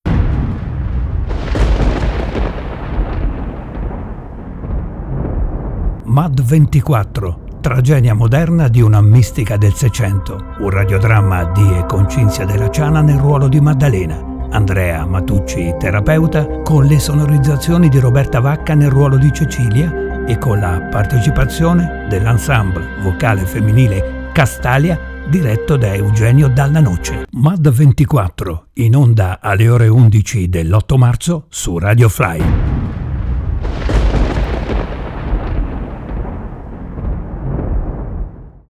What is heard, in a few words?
jingle; dal libro di cinzia della ciana; 8 marzo; radiofly